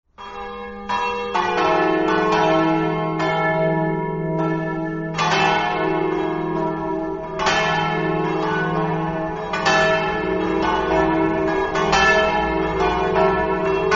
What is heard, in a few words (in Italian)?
campane